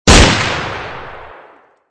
snipe

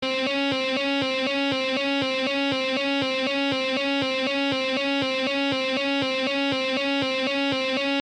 Guitar Loop; aperture tag the paint gun testing initiative

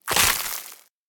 Cocoon Hit; Enemy Boss Pastor Cruzes

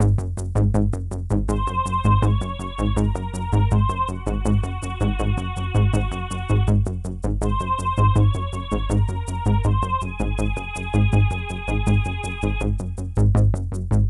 sounds music; Halo Combat Evolved; Xbox

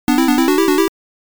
Adding energy; Libble Rabble

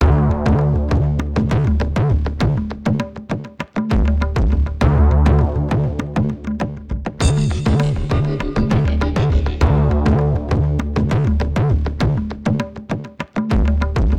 Thump; Stormrise

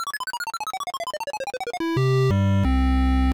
Jingle, Dead; Donkey Metronome - Dead; Nintendo DSi

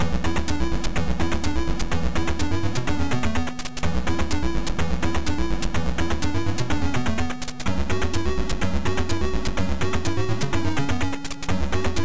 Firing Range; combat school zx spectrum 128

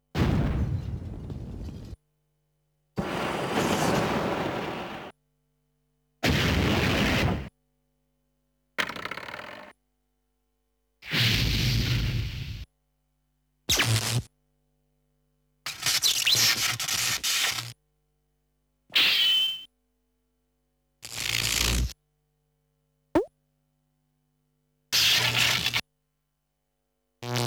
S.E. Collection

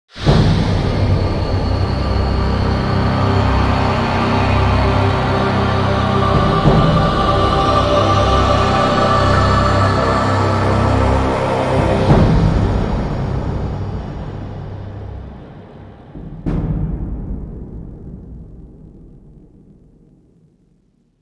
ominous dragon soul absorb music; Evhacon 2

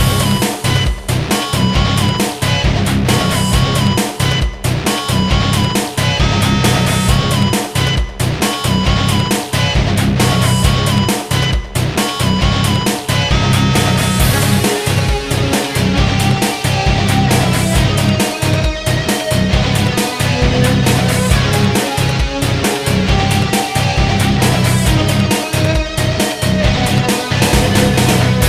Action; pirate hunter windows gamerip 2009

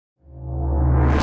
Mus Tsunami 145 Light; From Dust; PS3; Xbox 360

